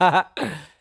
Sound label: laugh